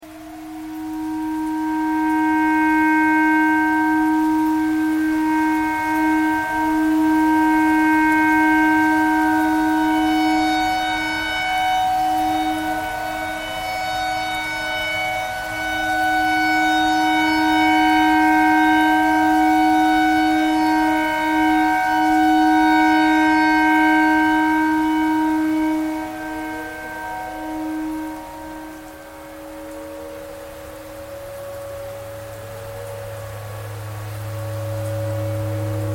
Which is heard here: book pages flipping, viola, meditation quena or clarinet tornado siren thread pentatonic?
clarinet tornado siren thread pentatonic